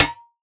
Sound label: Bell Reso Noise; One Shots; Drums; Ableton Live 12 Lite; Core Library